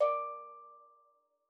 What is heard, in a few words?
D4, STEELDRUMS; Xsample VOL-4